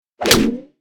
Cartoon slap; cartoon impact

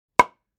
Hammer hitting wood panel single hit; gfxsounds

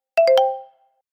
Positive message notification

